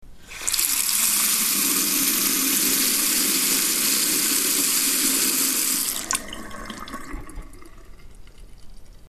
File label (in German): wasserhahn hände waschen